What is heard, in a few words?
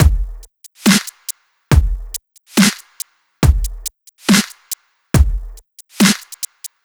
140 drum loops; BigSteppah; M8; Electronisounds